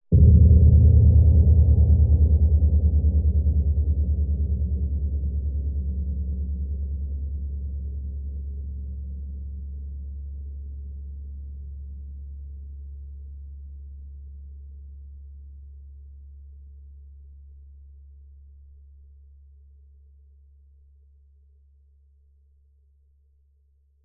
hit impact dark deep metallic atmospheric low pitched; sound design